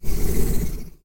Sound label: Results Panel Fade